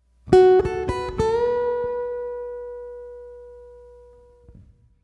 bend phrase, guitar string bending

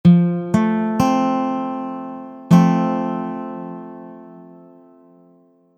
F chord basic, guitar; F/C (F over C)

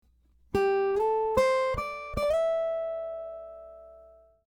guitar slides phrase; slides on guitar

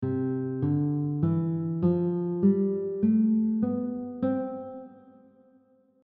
C Major scale example one octave, guitar; C Major Scale one octave notation

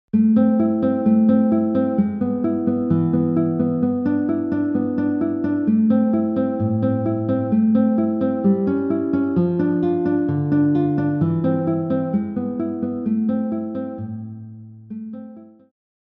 Study in A minor, classical guitar; Aguado

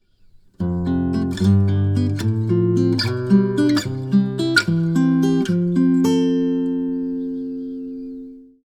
Spread triad, guitar; Spread triads example 4

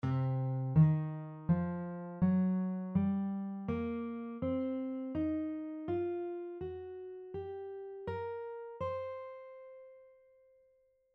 C minor blues scale, guitar; The C minor blues scale has the notes C, Eb, F, F#, G, and Bb

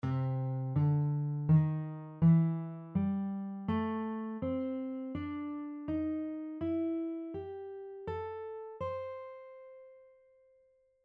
C Major blues scale, guitar; The small numbers on top of the notes represent the suggested fingerings for your fretting hand

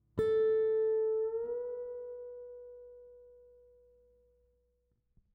whole step bend, guitar; guitar string bending

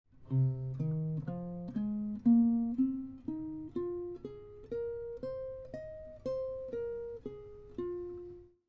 F minor pentatonic scale, guitar; This is an F minor pentatonic scale using shape 4

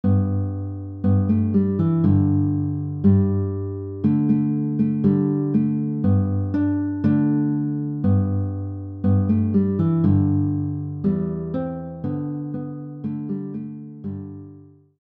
guitar; Go Tell It On the Mountain; christmas songs

